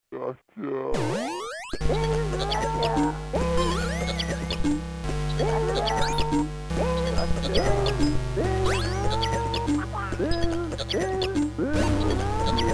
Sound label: circuit bent song; delfycreations